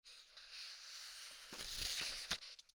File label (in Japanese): 本をめくる